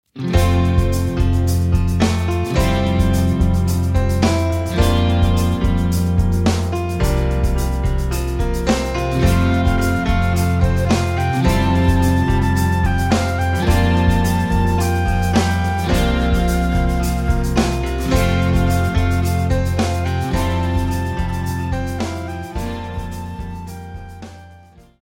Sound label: Instrumental